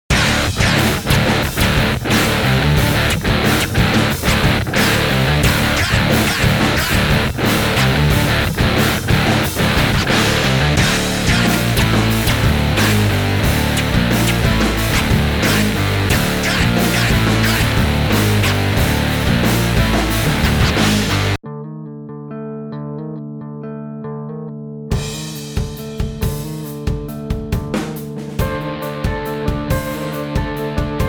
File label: arena rock